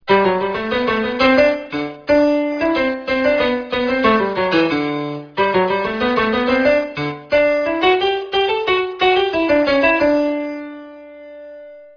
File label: instrument